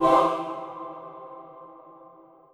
choir

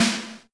soft drum

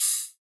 Hithat

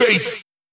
Bass Bass

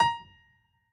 piano hi

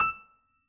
piano

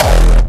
Hardstyle

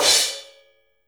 crash short; osu

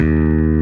bass

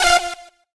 synth; osu

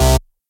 bass

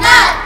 heart; osu

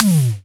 voom; osu

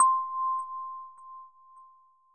end chime

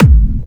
normal hit whistle

